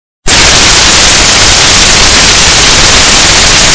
quiet asmr

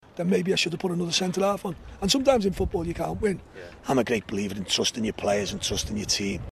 voice crack

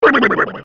bottles cheeks

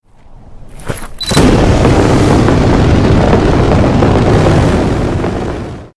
jetpack sound

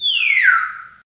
penguin fall; bombsquad

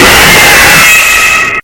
jumpscare sound; fazbear return

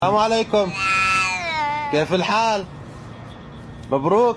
Arabic cat

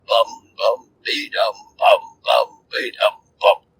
humming

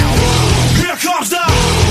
boom

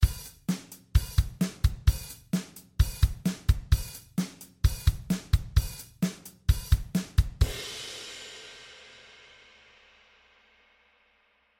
drums to fill time

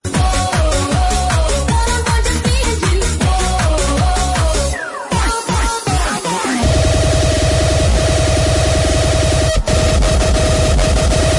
hardcore boom

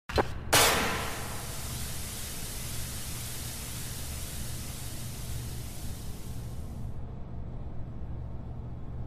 smoke grenade sound effect

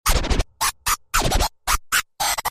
scratching

